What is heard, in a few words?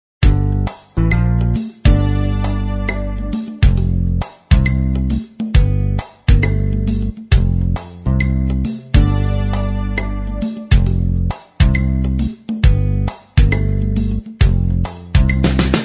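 kids tv theme Instrumental Track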